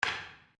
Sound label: Wood On Wood